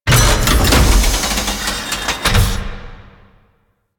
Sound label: Machine Open; Warframe; Quests; Entrati Labs; Cavia Rank Up Scenes